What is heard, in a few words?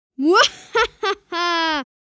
Funny kid spooky laugh